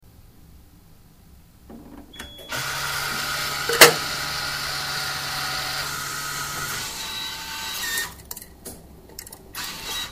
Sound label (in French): distributeur